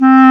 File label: clarinets; 90s Sample CDs; Club-50 - Foundations Roland; Wood Winds